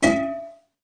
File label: metal